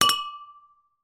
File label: glass beer empty clink on other; zapsplat